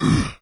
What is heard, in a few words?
pain; doom3; commando